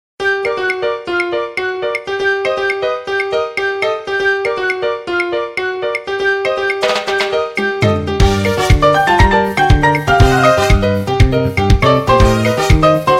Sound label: montuno nokia ringtone